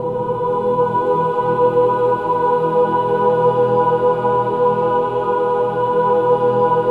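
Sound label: major chord, Symphony of Voices; Spectrasonics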